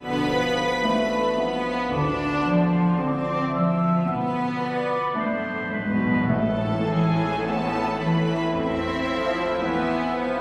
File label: Happy